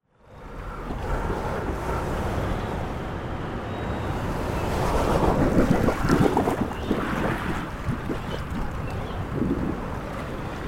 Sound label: Lehua blowhole